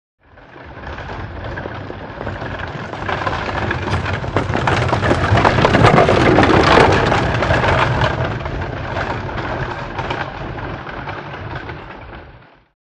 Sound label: By Carriage Up Past Fa